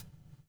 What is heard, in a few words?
BUBL, BUBBLE; Spectrasonics - Backbeat